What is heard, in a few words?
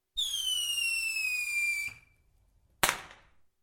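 New Year party popper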